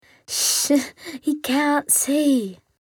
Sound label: Trash Can